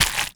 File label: octo steps; Garry's Mod; tf2